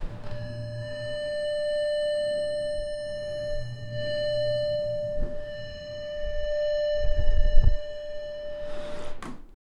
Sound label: ding elevator sound